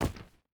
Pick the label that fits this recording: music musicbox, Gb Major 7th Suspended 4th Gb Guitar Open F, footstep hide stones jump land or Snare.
footstep hide stones jump land